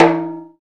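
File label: PER PROCU, Procussion, percussion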